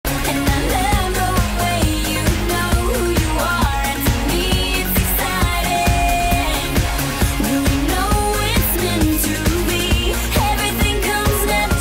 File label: English ringtone